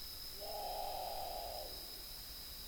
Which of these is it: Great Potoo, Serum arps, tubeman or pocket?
Great Potoo